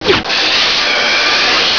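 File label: missile, sound effect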